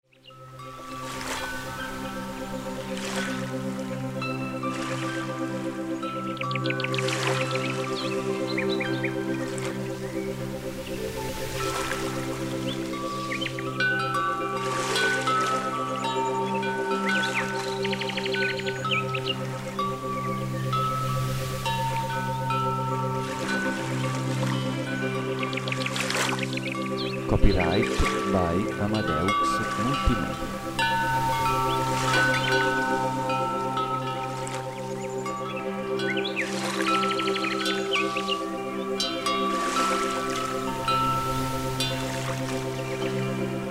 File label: piano relax asmr 528 8hz